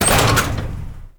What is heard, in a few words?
glyph puzzle coop locker open; Warframe; Duviri